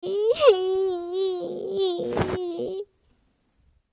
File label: Tandem Bike Action; Seer; GamigoNA